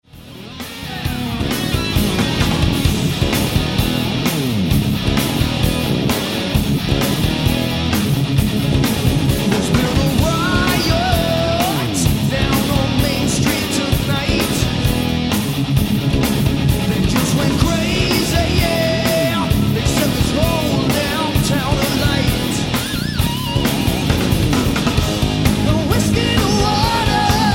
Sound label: riot scream